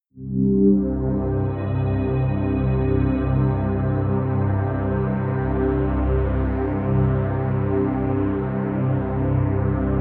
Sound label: pad sweep turbine; flaechen